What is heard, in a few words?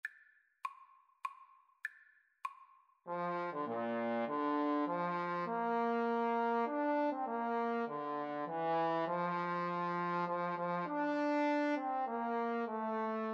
Trombone Duet